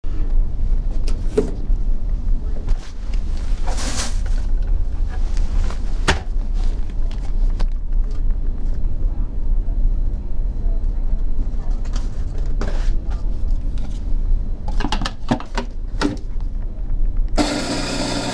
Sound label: Making Coffee; Kennewick WA